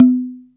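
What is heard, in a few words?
mellow round; REGGAE+ETHNC; Pro-Rec - Synthsonic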